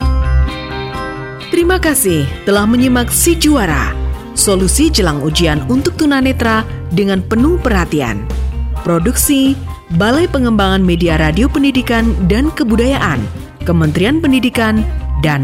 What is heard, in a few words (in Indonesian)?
Tune Penutup